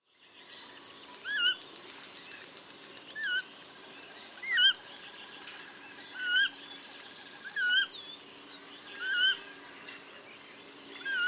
Coot Sound

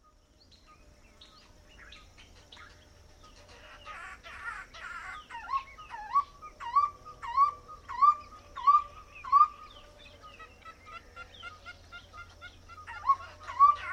Barbet Sound

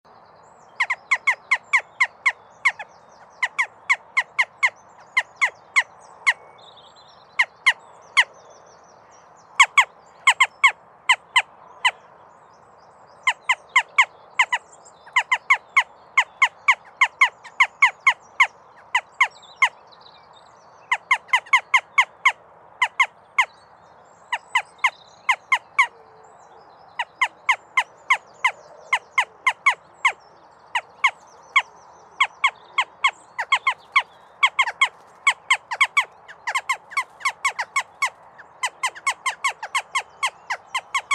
Jackdaw Sound

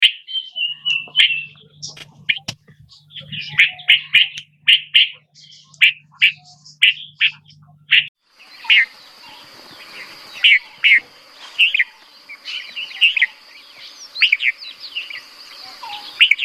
Red Vented Bulbul

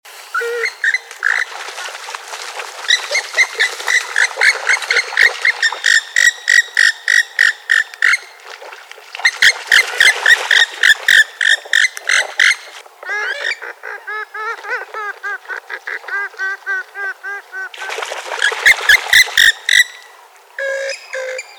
Swamphen Sound

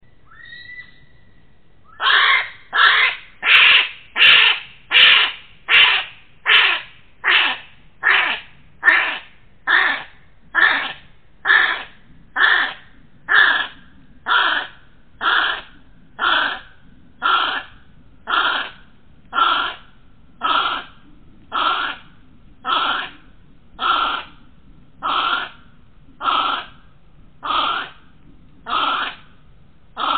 Kiwi Sound

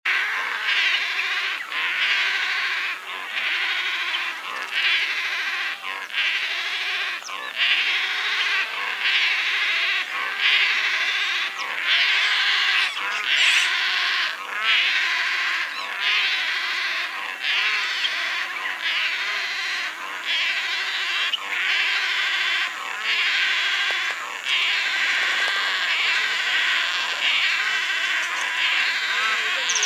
Stork Sound

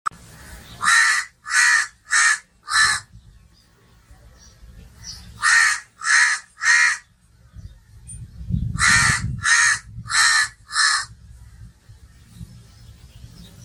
Crow Sound Calm Bird Ambience

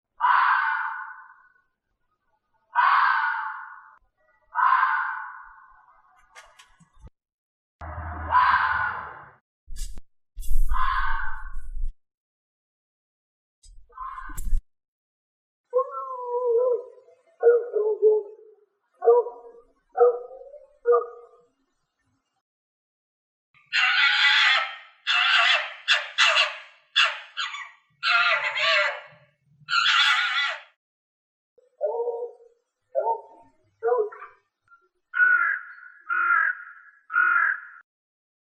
Fox Sound

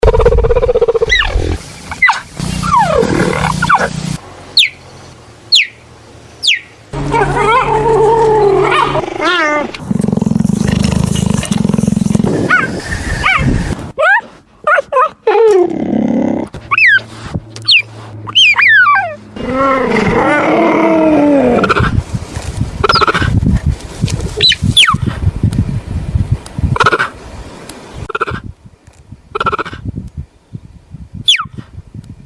Cheetah Sound

